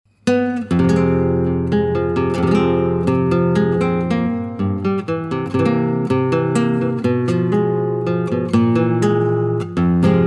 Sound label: Fm gtr